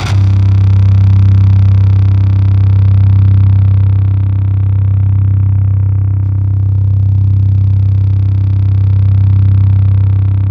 C1, Synth Line; 90 s Sample CDs; NiceBeats; SynthLine VOL-33

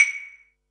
Perc, Percussion and FX; Pearl SC-40

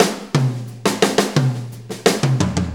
4-4 Fill, Drum Loops

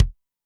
kick, Zoom MRT-3B; Kit5

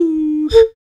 Human Perc

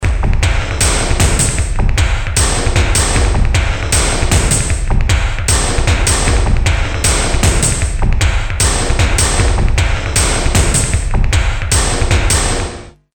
Clacky Loop, Drum Loops; Industrial

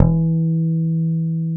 P-BASS E1; Sonic Reality - Vintage Timetraveler

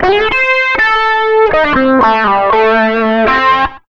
SOLO L, Electric & Acoustic Guitar Loops

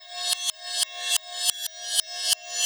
Cymbol Particle; Particles

